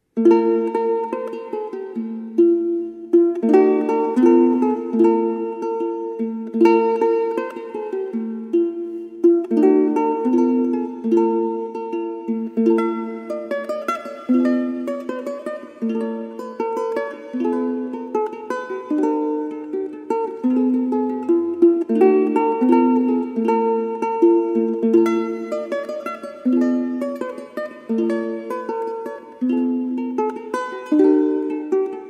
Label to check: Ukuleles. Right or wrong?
Right